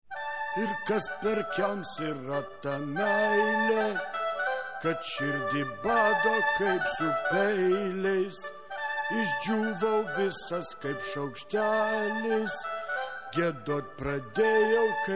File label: HandBell sound track